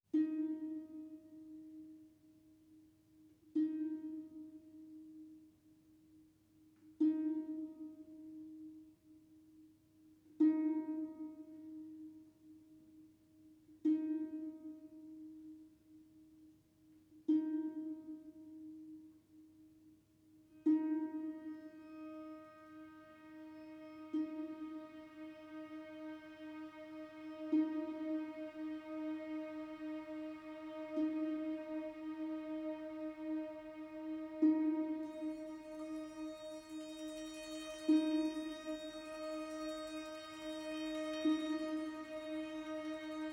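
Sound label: 70bpm 4-4, game music; Planet of Lana; Dreams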